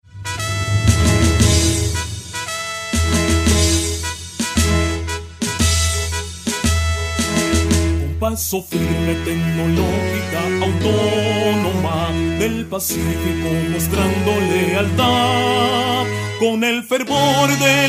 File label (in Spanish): Himno; La TAP